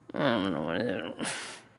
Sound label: nv grumble; smite; awilix